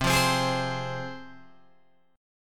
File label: C Suspended 4th Sharp 5th, C, down, Guitar, Open G